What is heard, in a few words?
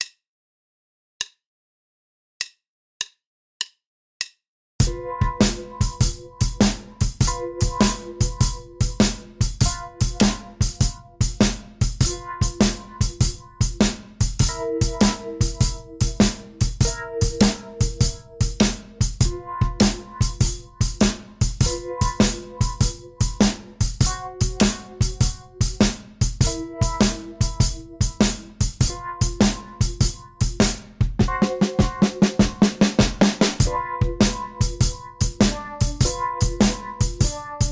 12 Bar Blues In G no Bass